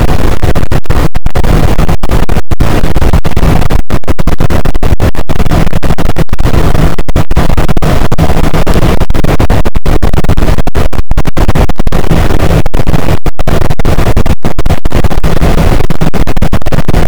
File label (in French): cachalot negatif, boat, Physeter Macrocephalus